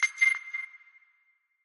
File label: enter; pvz